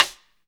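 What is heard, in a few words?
snare drum rim; Best Service Gigapack 2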